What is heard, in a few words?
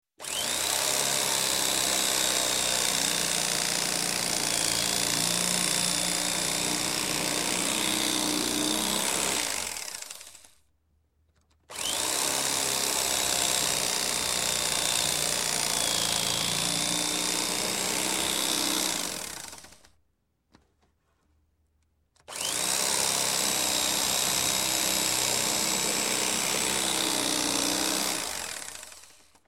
Knife, Electric Slicing, Food; Sound Ideas 2000 Series